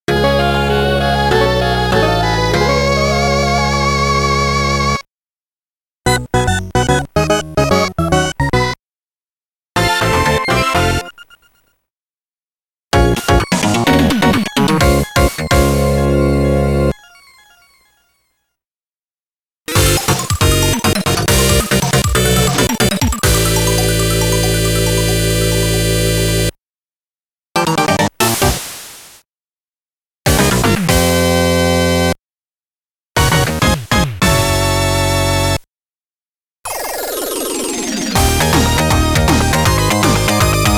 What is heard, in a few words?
Professional jingles; pro ni naru mahjong ds